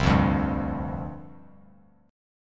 C Suspended 2nd Suspended 4th C Bass Standard; Csus2sus4 strummed; Csus2sus4 chord

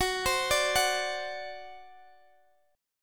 C Suspended 2nd Flat 5th Gb

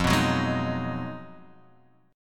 B 6th F, guitar chord; B6/F; strummed

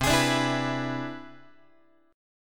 C Augmented Major 9th, Guitar; strummed; JGuitar

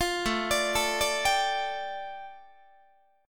A 7th Suspended 4th Sharp 5th A, Guitar; A7sus4#5 arpeggiated

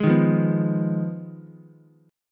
F Minor 7th Flat 5th F down Ukulele; Fm7b5 strummed; JGuitar

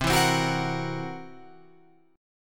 C 7th Flat 5th C down Guitar Open F; C7b5 strummed; JGuitar

